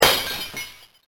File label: break window